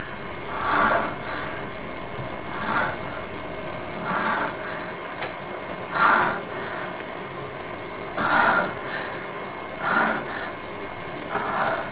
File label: masturbation